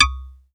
FM IT C2; Q Up Arts; Dream Experience

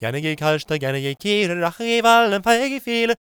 GAELIC, World Voices; ILIO; Vocal Planet

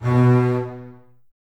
bass B 2, String Ensembles, Basses, Detache; Miroslav Vitous; 90 s Sample CDs

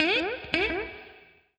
NOIZ, Guitar

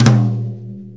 Brush Tom; Sonic Foundry (Sony Creative Software); Drum Tools; Brushes

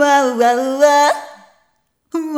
Rhythmic G

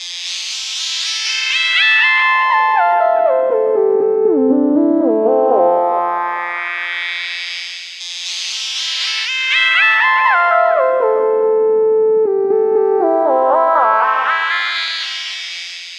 Synth, Modular Electronica; Sonic Foundry (Sony Creative Software); TSS Autopilot

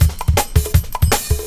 Pop 162, breaks

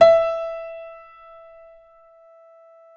PNO FF; Synclavier Sampler Library

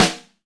DYNO SNARE, SR-16 DRUMS; EdgeSounds

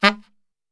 G#1 X SAX SHOT, Brass Super Section; Best Service

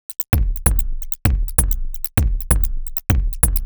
Mice 130bpm, House Drumloops; Zero-G House Fabrik Drumloops; ZG Drumloops

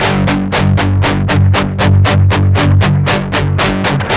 8TH G#2, Guitars; Spectrasonics; Hans Zimmer Guitars VOL-1; 128-JACKHAMR

